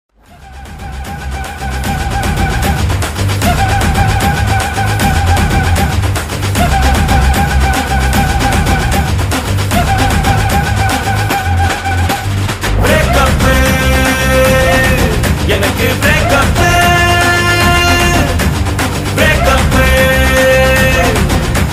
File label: bgm breakup song ringtone bgm